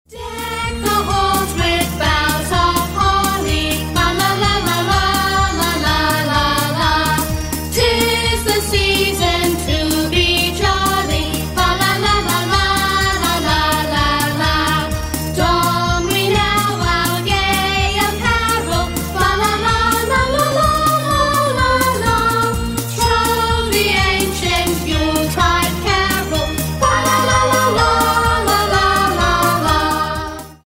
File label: Christmas Choir, Deck The Halls; Deck the halls with boughs of hollyFa la la la la, la la la la / 'Tis the season to be jolly